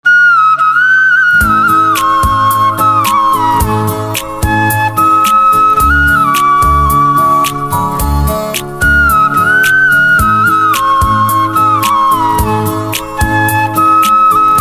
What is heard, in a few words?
Flute, instrumental ringtones